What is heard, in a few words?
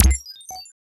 Computer Beep; FREE SFX PACK VOL 3